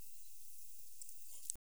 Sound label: Rhinolophus ferrumequinum; Lerma